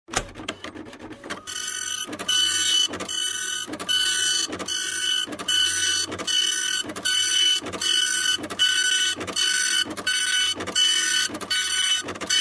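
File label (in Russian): печать принтера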